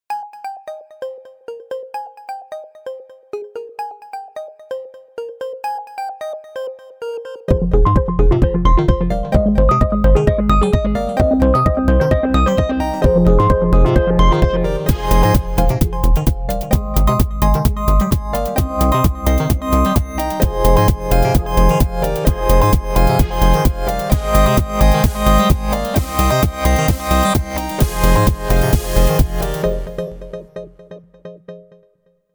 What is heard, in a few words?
Saws vs Bell; montage